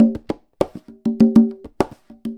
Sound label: Conga Loop (100BPM); musicradar